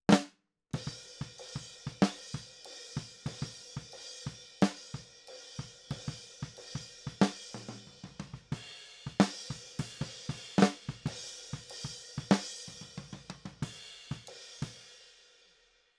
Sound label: Snare